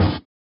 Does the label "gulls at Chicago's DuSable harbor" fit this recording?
No